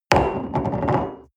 coffee place table hard wobble, restaurant cafe

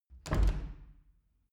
wood door old reverberant soft

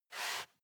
step barefoot wood plank slide short; Footsteps; schritte

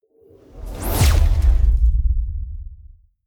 sci-fi plasma gun fire swoosh; pistolen gewehre

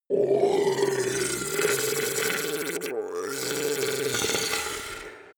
ice golem melt groan slowly; koerpergeraeusche; comedy-cartoon; soundpool

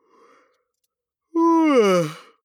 male yawn vocal; koerpergeraeusche; menschen; soundpool

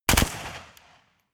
rifle ak-47 exterior fire double light; comedy-cartoon; Pistols and guns; pistolen-gewehre

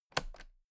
toolbox latches close heavy

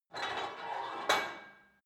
put weight onto barbell quick, fitnessstudio

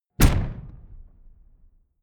sci-fi shot zing subtle; schusswaffen

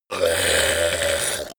zombie grunt choke male long

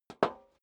step high heels metal dry single stair down; schritte

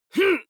male fight groan; vocals; comedy-cartoon; soundpool

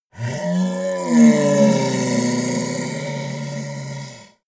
monster dark growl hoarse; kreaturen

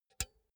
pull out cinch quick; objekte; elektronik

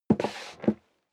step leather shoes wood creak scuff; schritte

